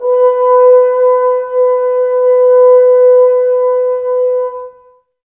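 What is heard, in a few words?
Brass French Horns, Woodwind Brass Ensemble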